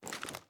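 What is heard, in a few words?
reload mag up, shotgun; reloads; iw8; romeo870; gmod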